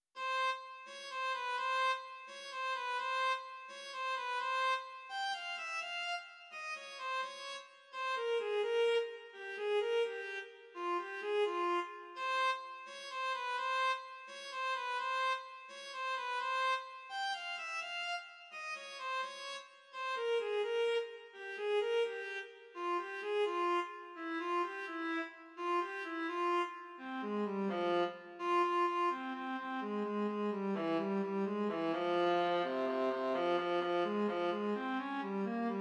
Soliloquy for Viola

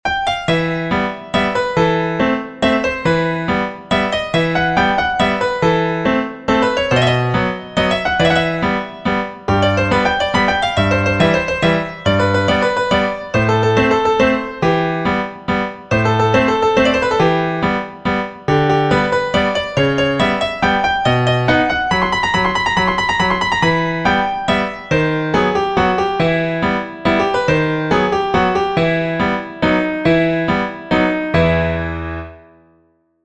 waltz

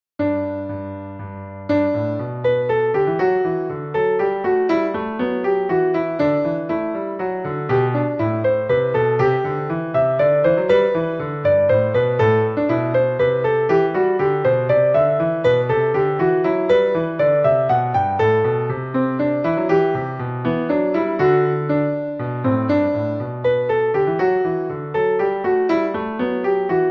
menuet

